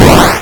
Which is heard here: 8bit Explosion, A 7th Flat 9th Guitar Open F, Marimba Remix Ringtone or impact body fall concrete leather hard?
8bit Explosion